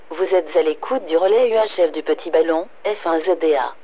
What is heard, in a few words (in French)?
Balise courte; F1ZDA